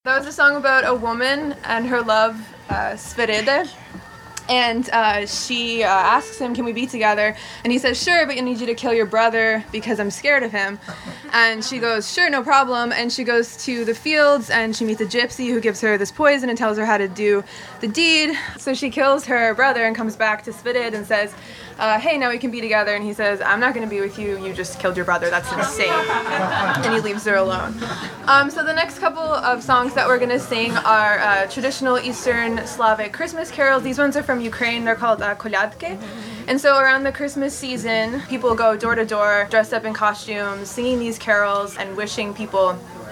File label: carol; Pan Hospordaryu; Live at Barbes for WFMUs Transpacific Sound Paradise